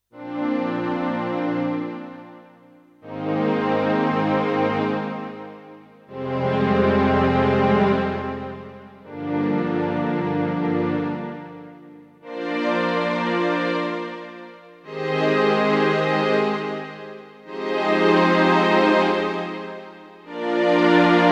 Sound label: Strings; Casio CDP-S350